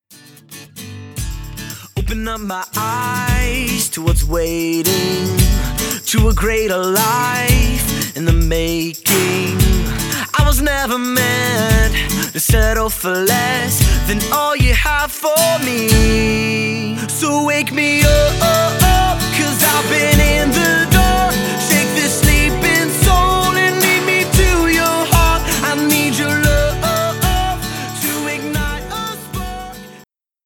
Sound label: (acoustic)